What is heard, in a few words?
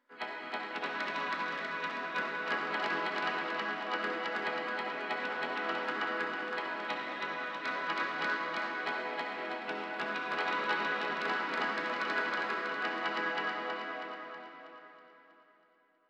blocky color pad